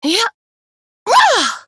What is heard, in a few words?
ring; dqxi; serena